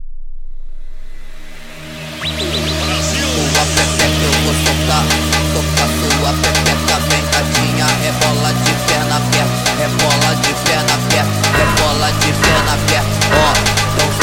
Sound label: PHONK